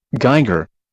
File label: geiger